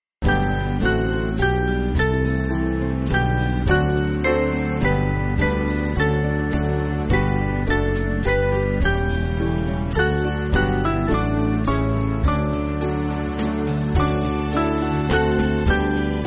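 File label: Band, Backing Files for Churches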